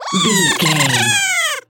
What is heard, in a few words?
goblin fairy laugh intense